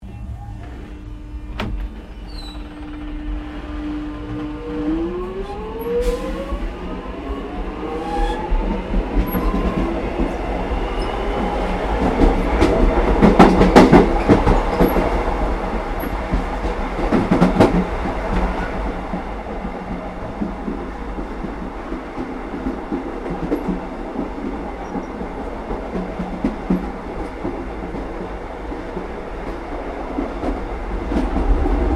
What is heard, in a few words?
Train Melbourne Passenger Xtrapolis 100 Tween Deck Stops; Melbourne train interior; Trains